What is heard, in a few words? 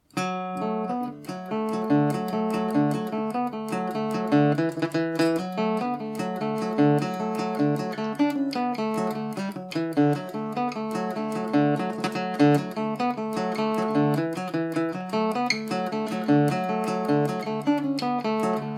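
D major, irish mandolin; humours of glendart; The Humours Of Glendart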